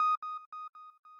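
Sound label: square lead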